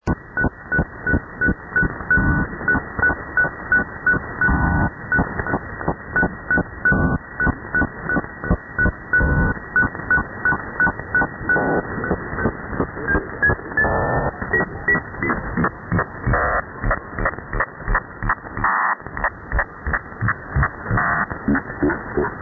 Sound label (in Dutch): geluid; 80 mtr; Haarlem